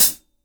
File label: hat b key, Royality free high-hat tuned to the B note. Loudest frequency: 9639Hz; Tuned drums